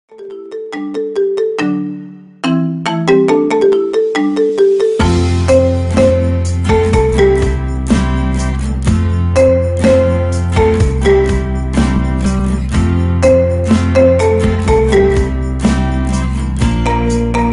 marimba ringtone remix

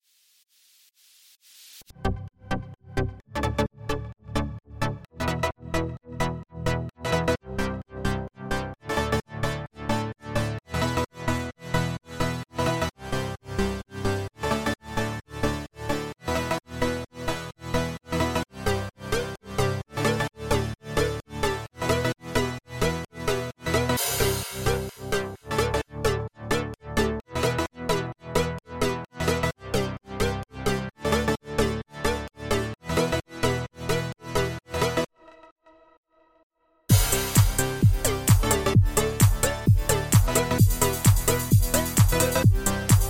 electro mix

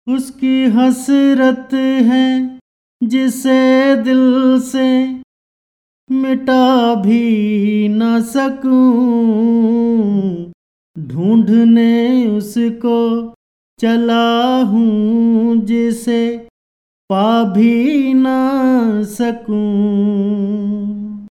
vocal ringtone

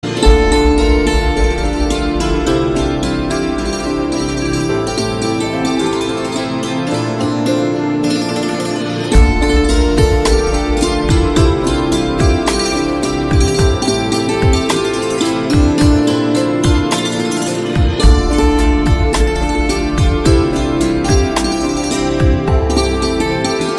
santoor version

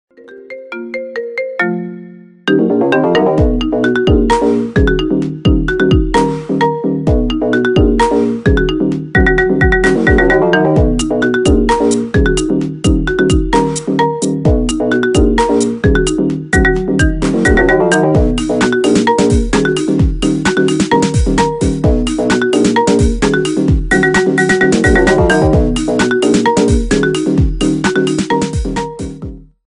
marimba ringtone remix

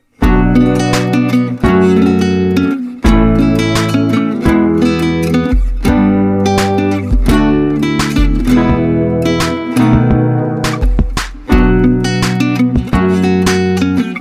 guitar ringtone

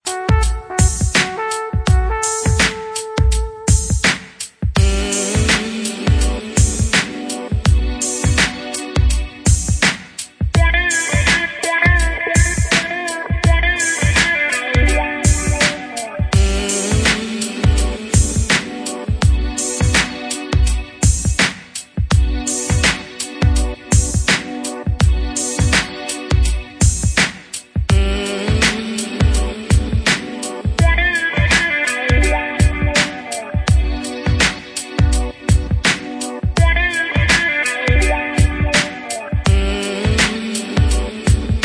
romantic

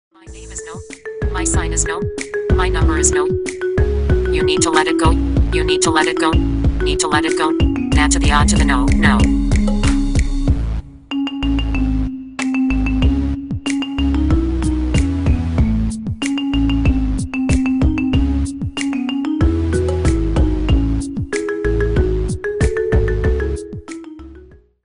marimba ringtone remix

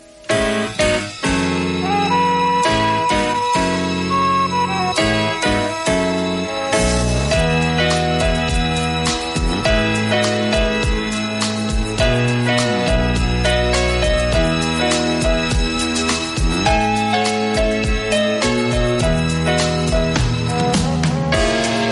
street piano old

